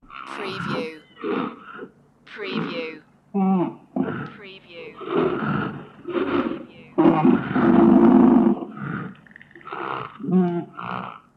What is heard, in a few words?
Rhinoceros Grunts Vintage